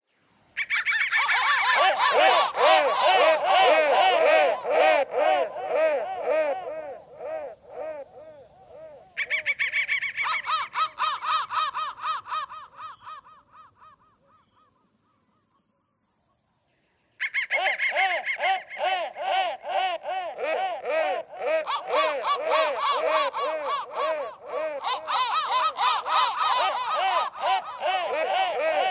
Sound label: crow thread noise